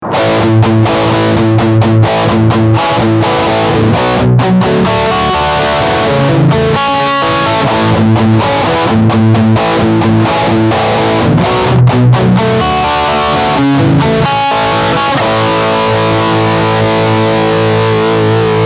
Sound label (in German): Verzerrer; ICON II